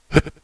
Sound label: jump